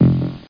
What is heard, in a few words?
missile active; WormWars